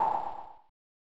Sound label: pop; AMOS Music